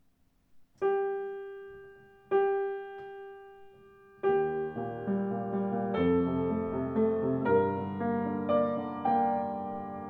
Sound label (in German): Bechstein 12N Klang, Klavier; 114 bechstein 12n weiss